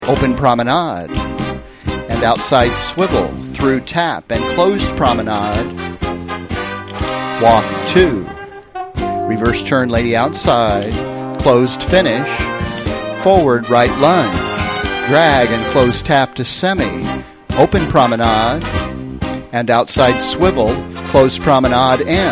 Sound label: Tango Cued